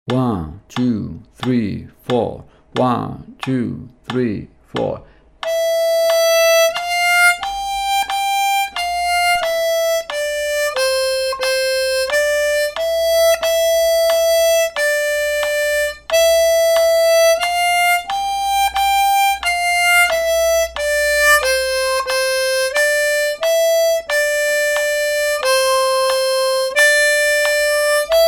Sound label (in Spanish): ODE TO JOY, armonica